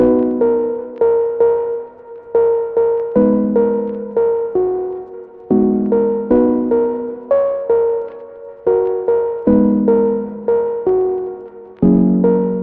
Lofi Melody Loop - 84 BPM B Min; Cymatics; Oracle Sample Pack

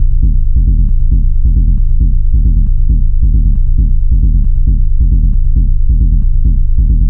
Bass Loop C 135, Techno; Ghosthack; AC2024 - Day 1 - Techno Sounds 2025

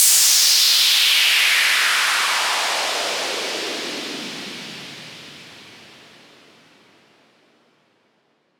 Downshifter, SweepDown; SFX; Ghosthack; Basic

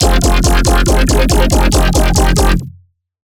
Melodic Bass F 140, One-Shots Bass Hits; Squid; Ghosthack; Color Bass Essentials

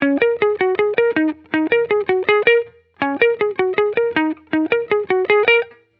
Guitar Melody Loop - 160 BPM D# Min; Future Bass; Instruments; Guitar Loops

